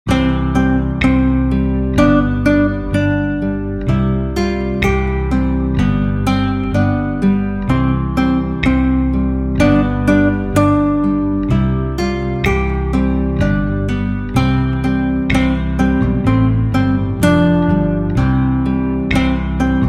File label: 63bpm; Sur le pont d Avignon